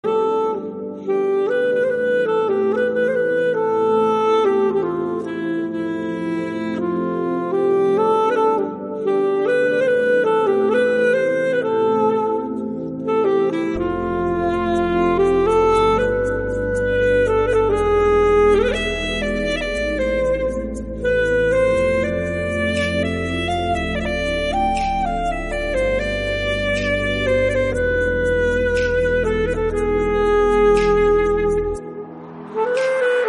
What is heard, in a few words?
instrumental bgm